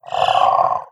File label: idle, headcrab reviver; creatures; vox